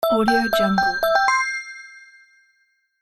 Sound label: positive correct bonus alert